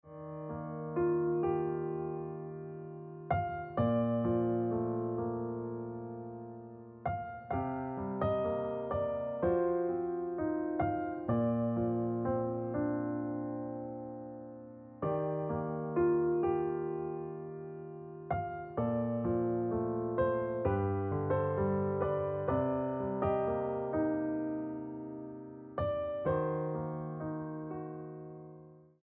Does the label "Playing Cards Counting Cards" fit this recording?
No